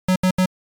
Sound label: Beep error